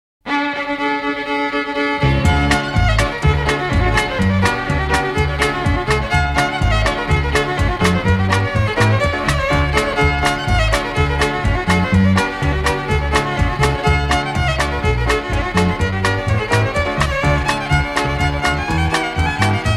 reel instrumental

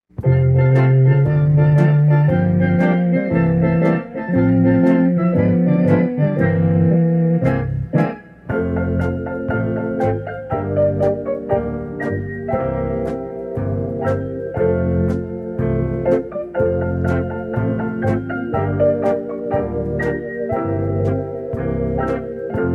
instrumental

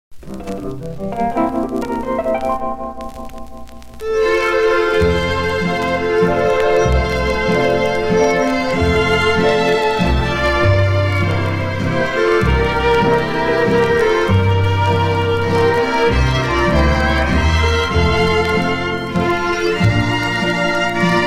lullaby waltz (instrumental)

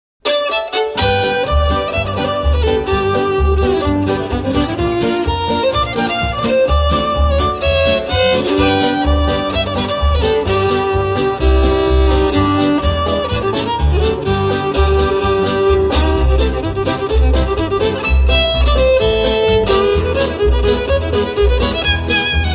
rag, instrumental

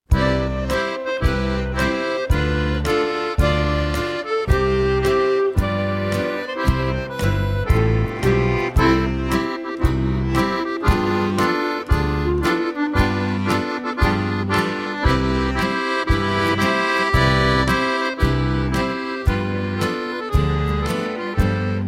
gavotte (instrumental)

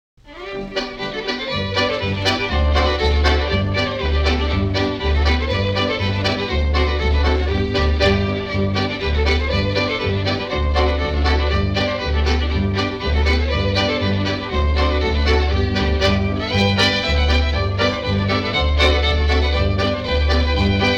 instrumental

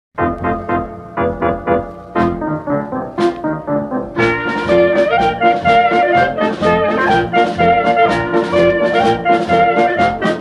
instrumental

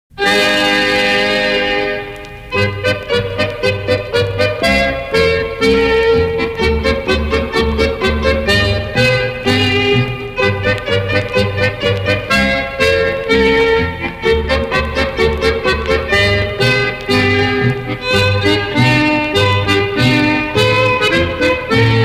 cshebogar (instrumental)

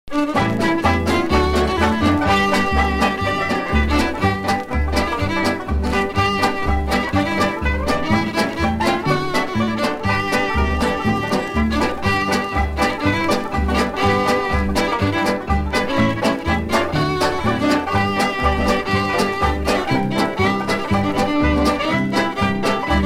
(instrumental)

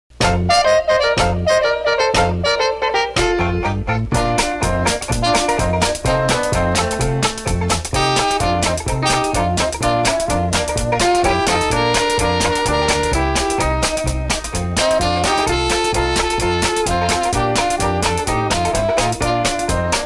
polka mixer (instrumental)

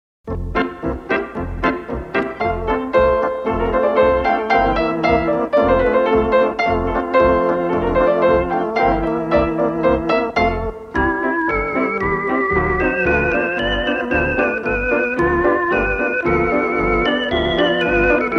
loomis lancers part 3 (instrumental)

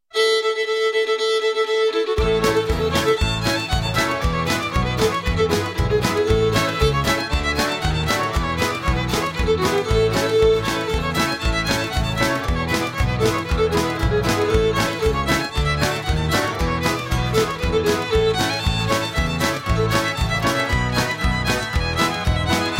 instrumental

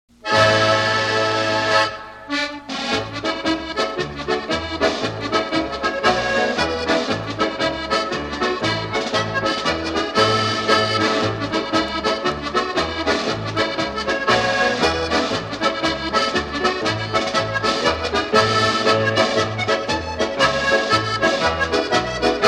medley, instrumental

